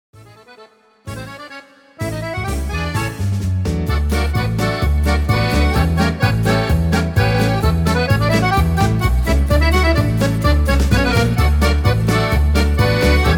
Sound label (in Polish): akordeon